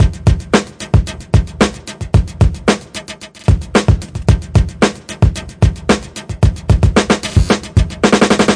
gentle glitch funk, 112 bpm, drum loops and breaks